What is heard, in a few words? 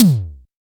SDS5 snare mid, Simmons; Beatbox; Akai MPC-500